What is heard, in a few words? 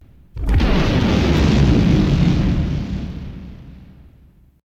Explosion; Vay